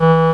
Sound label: Clarinet (E2); X-Wing; X-Wing Patches